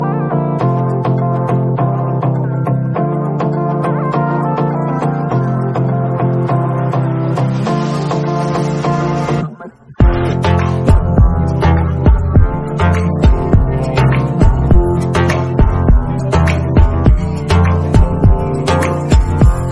instrumental

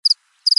insect cricket

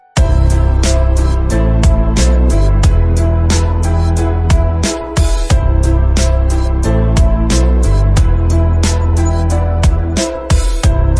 instrumental

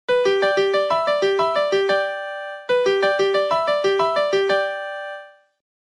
piano marimba

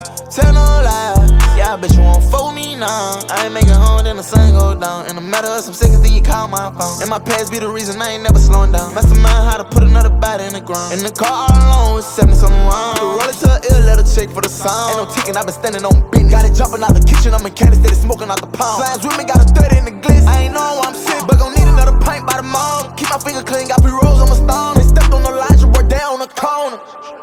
hood melody

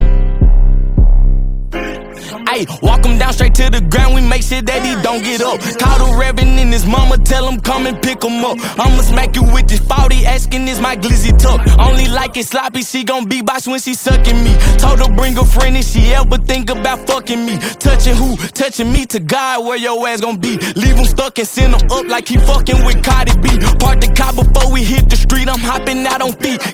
beat box intro

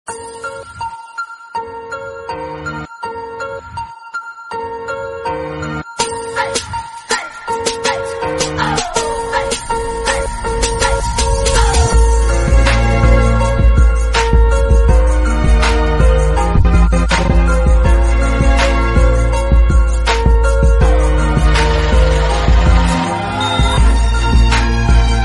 remix bgm

